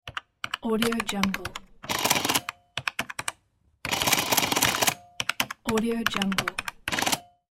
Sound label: electronic calculator calculating and printing